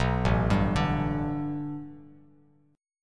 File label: A 7th Suspended 2nd Suspended 4th Bass Standard; A7sus2sus4 arpeggiated